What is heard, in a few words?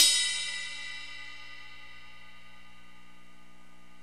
BELL; Roland LA